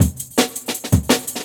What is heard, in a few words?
Jungle Breaks